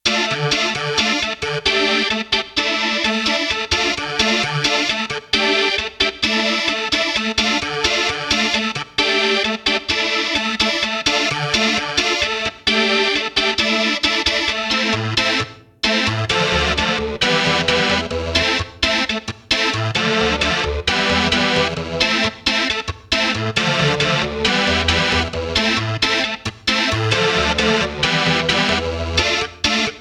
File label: JX-1 Rock Organ; Roland JX-1